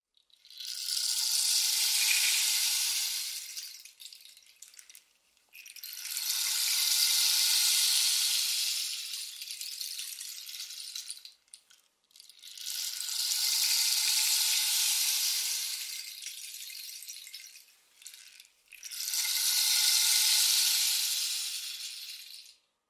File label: Rainsticks